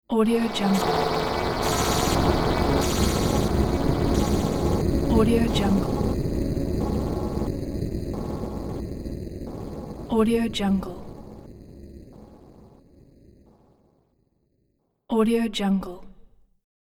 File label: horror sf interference